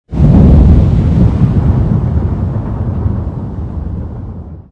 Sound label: Cloud Storm; nami; battle